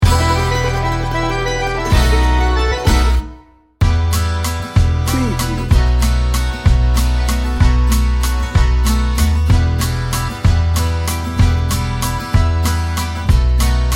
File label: italian romance bed